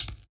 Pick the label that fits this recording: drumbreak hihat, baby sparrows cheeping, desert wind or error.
drumbreak hihat